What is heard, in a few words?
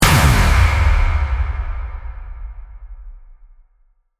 Hit Metallic Laser, Impact, Stutter, Accent, Transition; Production, Element, Imaging; BlastWave